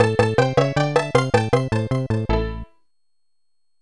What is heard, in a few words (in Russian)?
ИГРА ОКОНЧЕНА; MarioGunner Sound Selection, Vol. 2